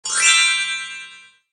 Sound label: freespin end melody; Red Chilli; slot